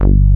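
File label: FAT PAN bass, JV 1080; Pro-Rec - Synthsonic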